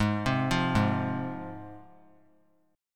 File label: G sharp 6th G sharp down, chord; arpeggiated; JGuitar